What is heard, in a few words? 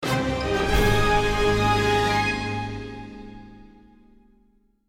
Item Get; LittleBigPlanet 3; PlayStation 3